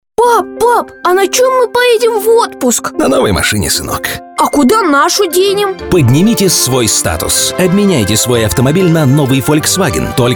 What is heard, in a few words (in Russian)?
folk